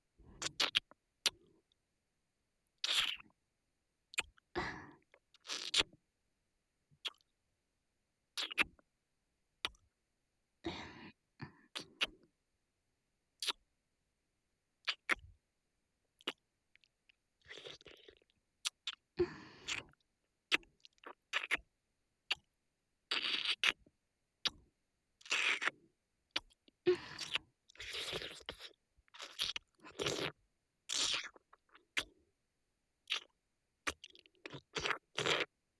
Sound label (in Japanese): キス 接吻